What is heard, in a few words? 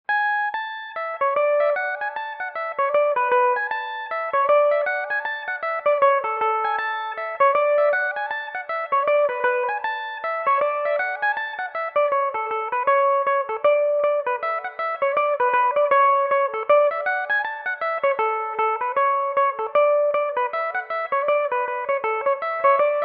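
A major belmuse, irish mandolin; clock in the steeple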